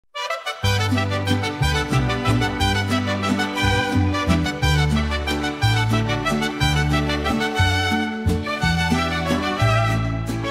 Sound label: mariachi; warmusic